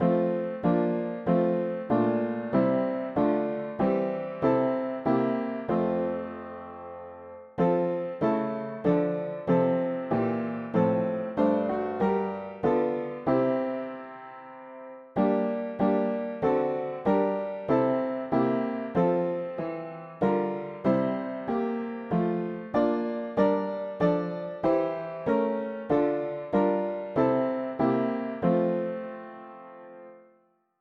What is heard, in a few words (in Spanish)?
mono piano; hymns; Himnario pequeno